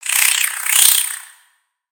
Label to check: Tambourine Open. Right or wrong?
Wrong